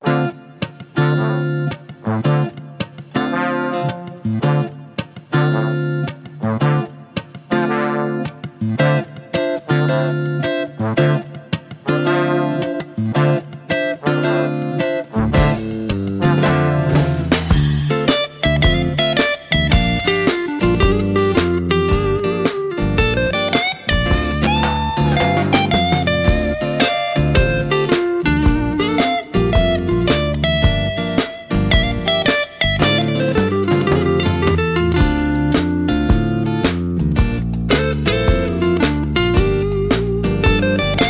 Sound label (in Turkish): Güzel Hikaye (Guitar)